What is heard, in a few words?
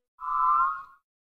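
mac os operating system sounds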